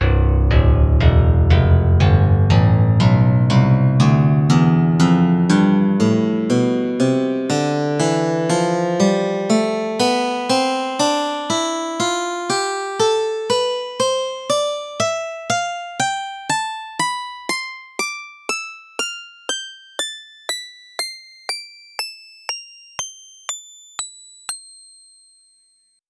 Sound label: steel string guitar; tonleiter